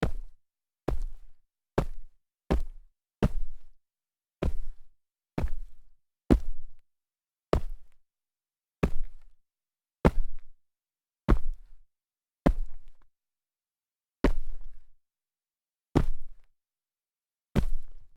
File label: sneaker medium solid jumps dirt